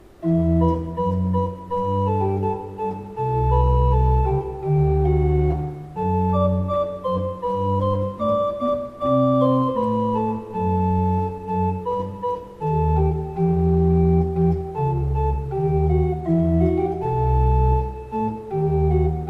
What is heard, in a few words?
Kleuker, pipe organ